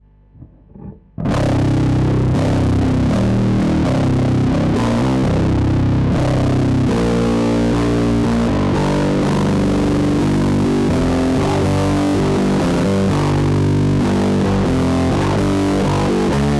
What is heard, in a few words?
muff LED; diy